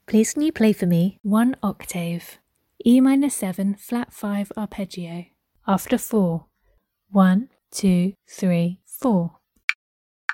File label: e min7b5 arp